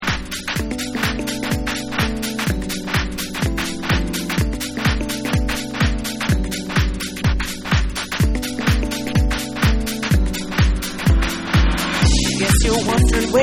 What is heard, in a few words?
Original Groove Mix